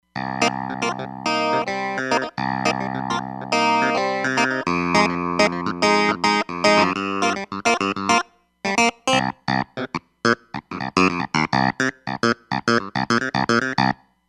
Clavi, Roland Fantom XR

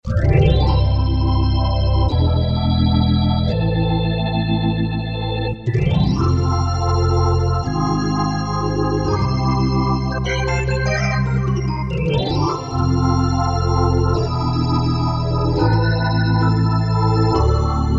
Jazzy Organ; Korg TR-Rack

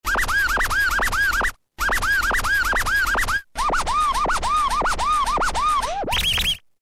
Scratch Loop; Roland M-DC1

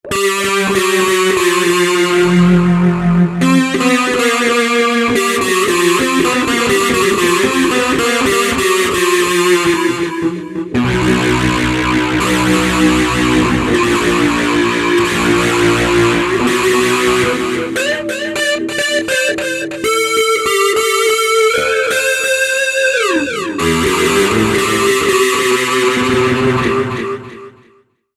PWM SYNTH

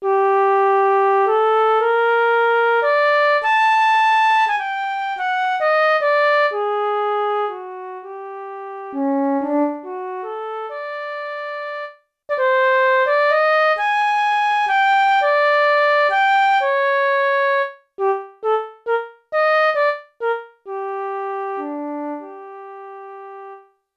Flute; Flute & Piccolo 1

